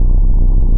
engine; stunt rally

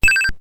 no ammo; missile command

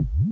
KEEN POGO SND; commander genius

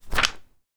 page, black book; edgar; boss

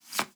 pick up card

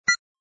bomb tick slow; agent 8 ball

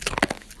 crunch; endless sky

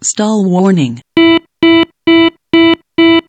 AOA warning; rigs of rods

